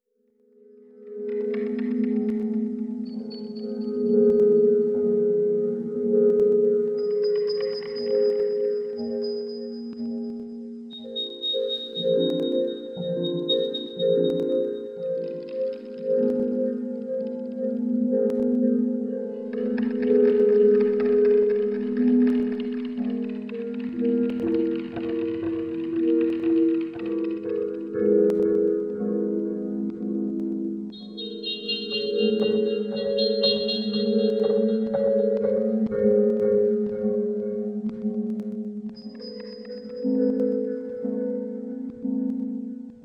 Ab Dorian 60

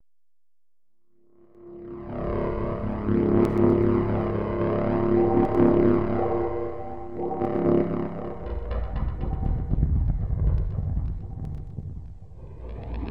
F Dorian 60, Deconstruction

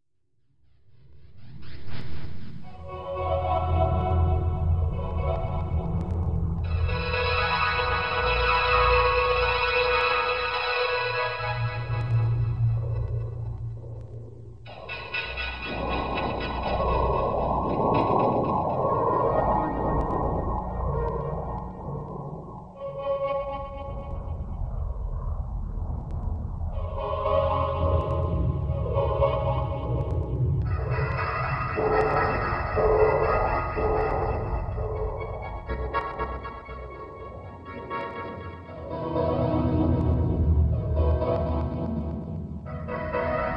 F Locrian 60, Deconstruction

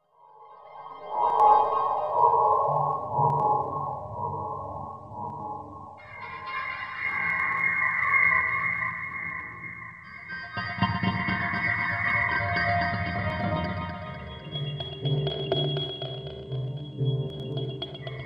C Aeolian 60, Deconstruction

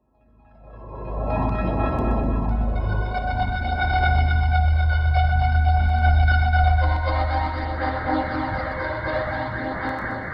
Bb Phrygian 60, Deconstruction

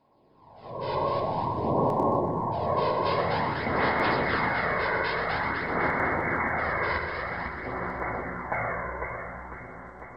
E Chromatic 60, Deconstruction

